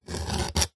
foley wood scratch with knife blade tip single short